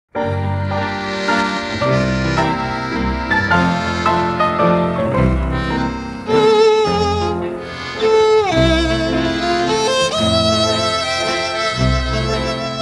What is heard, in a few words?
(instrumental)